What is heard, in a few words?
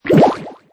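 minislime deploy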